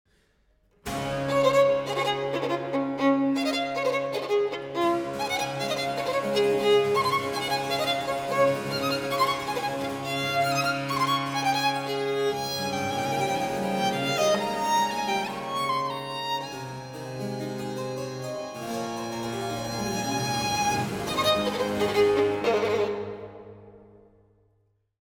Sonata in D Major Allegro Adagio Presto

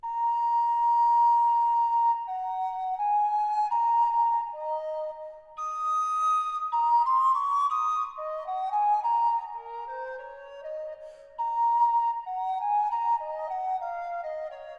Ricercata quarta

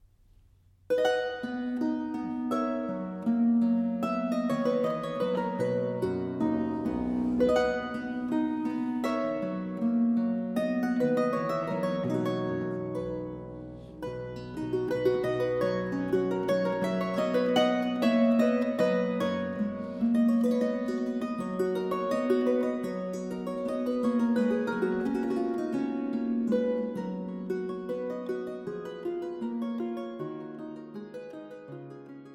Harp Sonata in C Major Allegro

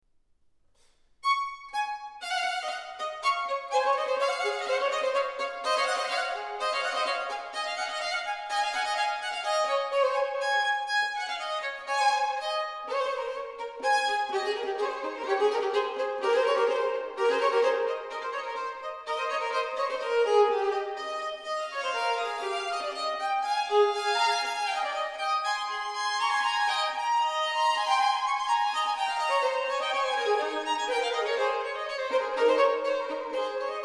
Sonata Canonica in D Major Spirituoso

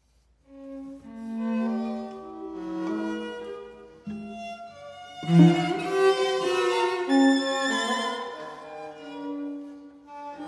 String Quartet Sehr fliessend; Webern String Quartet Op 28

